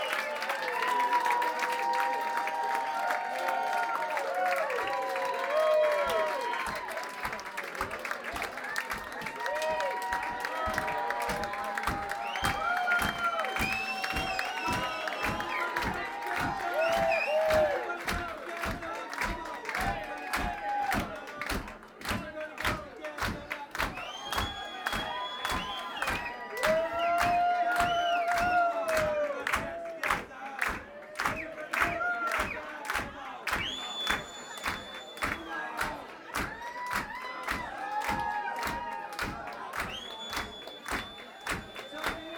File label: crowd noise